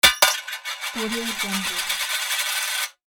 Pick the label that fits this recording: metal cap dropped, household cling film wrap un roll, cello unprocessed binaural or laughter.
metal cap dropped